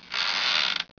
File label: creak sharp; ambience